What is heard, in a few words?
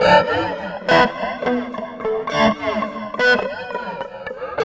GUITAR; East West; Phat & Phunky